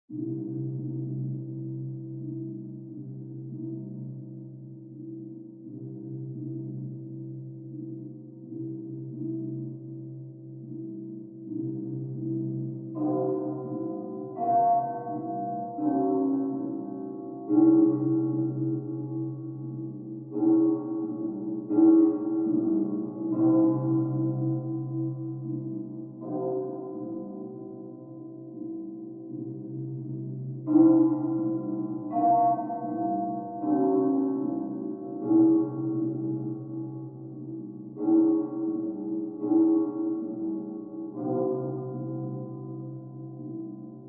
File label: Asylum Theme Piano Verb; Get Even; Ge Asylum 02; Insource